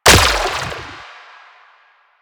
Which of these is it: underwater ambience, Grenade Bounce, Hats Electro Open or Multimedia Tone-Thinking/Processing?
Grenade Bounce